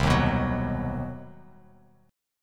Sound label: C Minor Major 7th Flat 5th chord, Bass Standard; strummed; JGuitar